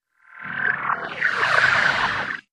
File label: Digital Water Drop Pan